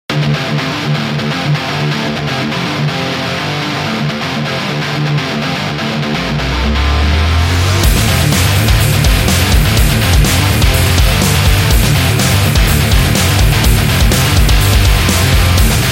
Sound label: Metal Rock